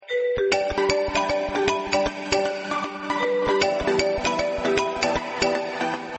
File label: iphone song